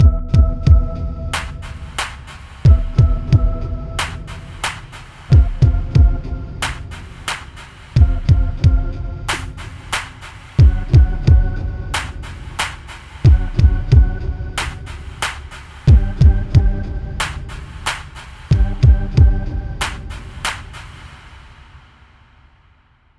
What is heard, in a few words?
Ditty